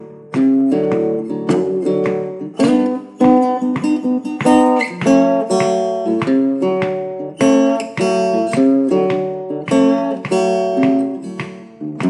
After Christmas Blues

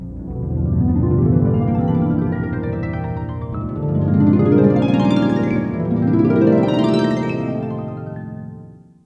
Miracle Jingle; Harfen Jingles für Wundervolle Sequenzen.

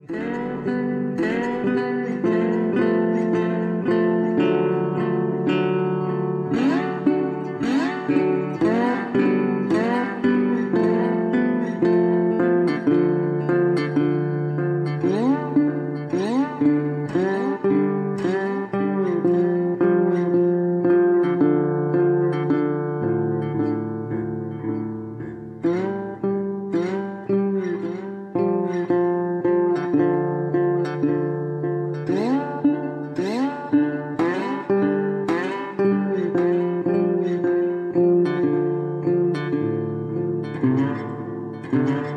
Psychedelic Blues, gitarre; Nachdenkliche Stimmung. Schlicht. Resonator Gitarree mit Delay. Natur und Landschaft.

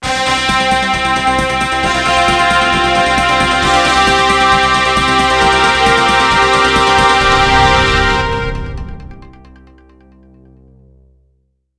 Fanfare News Jingle

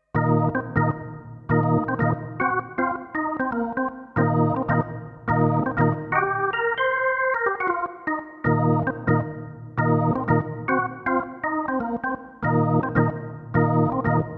Spacy Organ

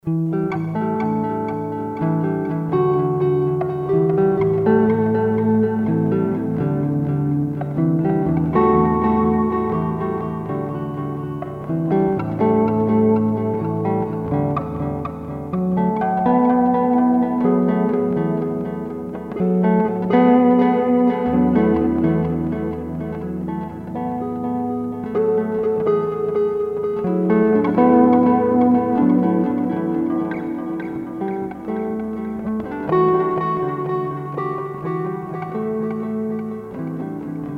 Country Dream Squences Double Delay

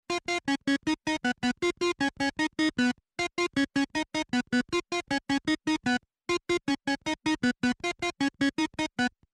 Sunny Easy Theme, Game

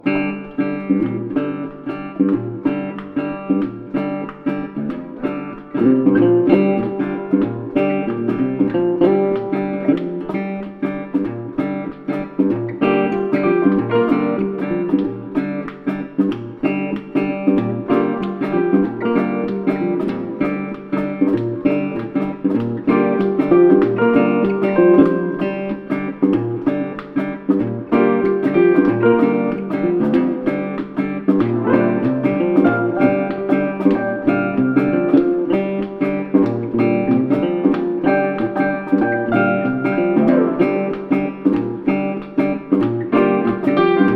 Instrumental Retro

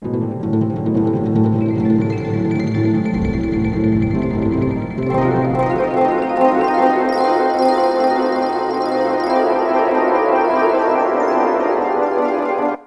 Harphsound Danger

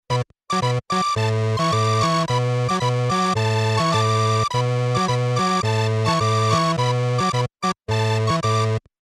Game Faster Tango Theme

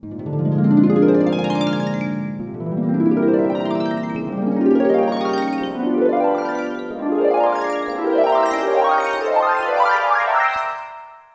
Miracle Jingle; Harfen Jingles für Wundervolle Sequenzen.

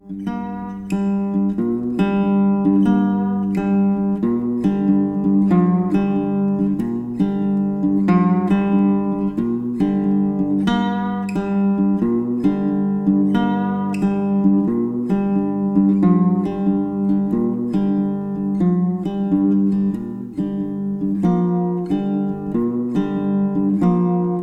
Guitar Riding Blues

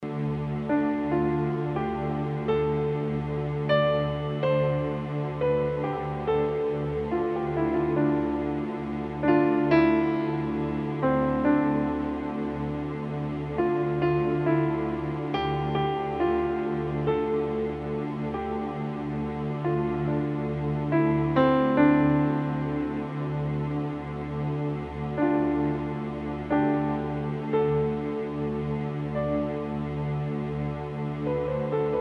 piano

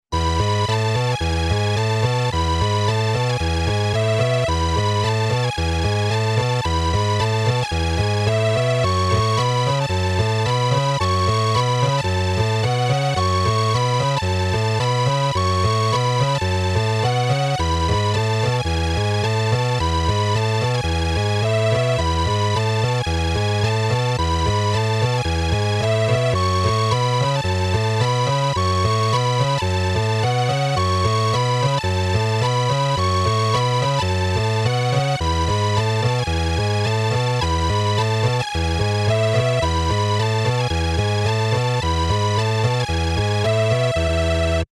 Chilli Workout Theme, Game

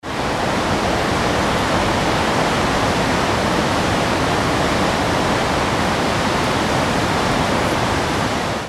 Gulfoss

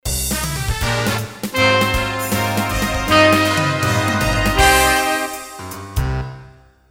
Bigband Jingle

